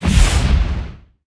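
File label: enemy attack big axe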